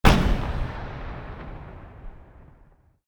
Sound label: explosion